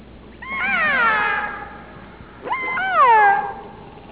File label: whales